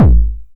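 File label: 909 BD tape saturation, kick drum; Goldbaby Tape808 & Tape909; The Tape 909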